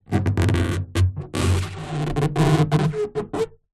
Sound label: foley balloon inflated finger scrape rub squeak; zapsplat